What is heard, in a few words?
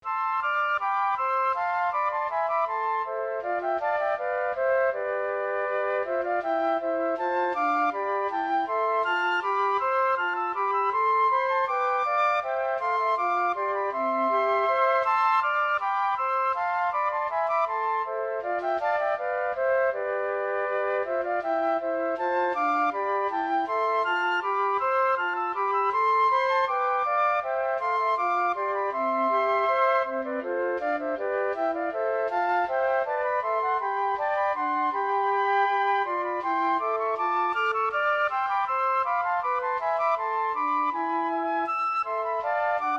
bourree, flute trio